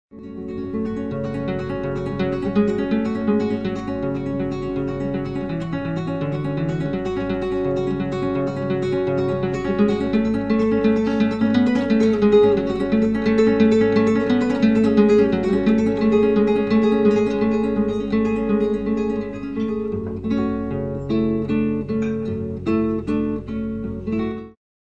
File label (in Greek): Κιθάρα